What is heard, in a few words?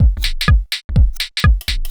HOUSE BEATS 125